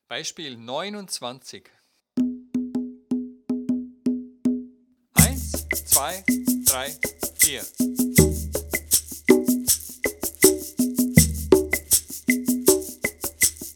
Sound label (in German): viererrhythmus